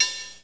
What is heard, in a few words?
clank